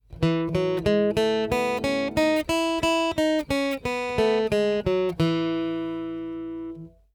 E Major Scale, guitar

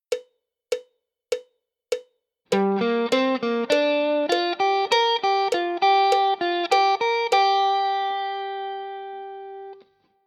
G minor pent lick, guitar

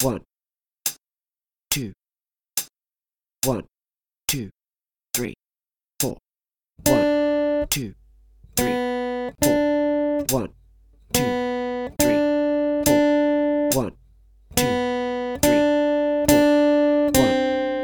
B string, guitar

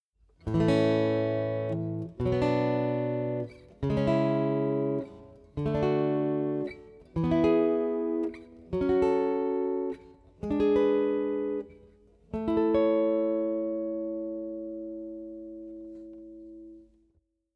Chords Key A, guitar

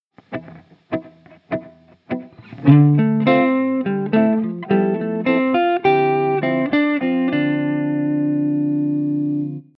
dorian, guitar

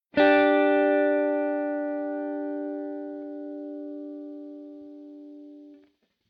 D and G together, guitar

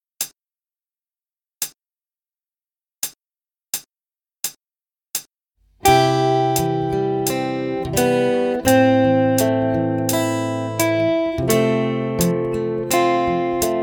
3 Strings fast, guitar

